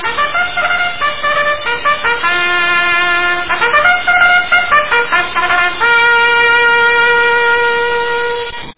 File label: quarter horse start bugle